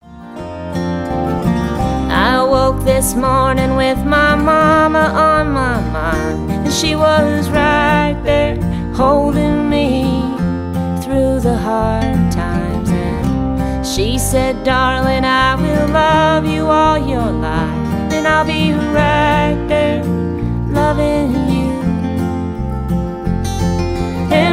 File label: parting glass verse